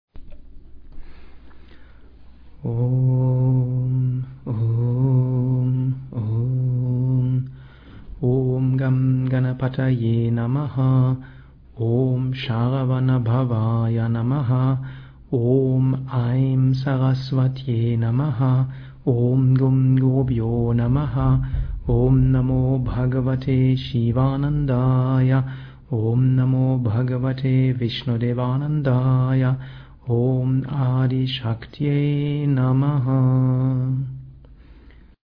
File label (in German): Anrufungsmantras Om Gam ganapataye Namaha